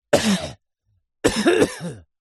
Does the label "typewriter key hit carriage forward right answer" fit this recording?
No